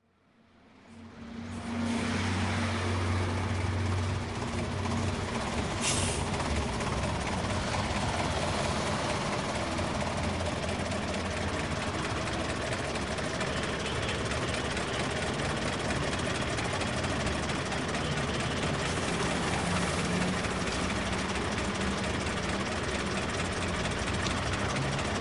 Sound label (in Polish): autobus